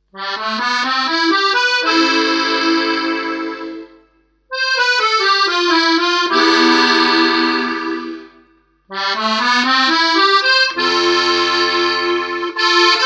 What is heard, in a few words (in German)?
langsamer Walzer (1. u. 2. Stimme)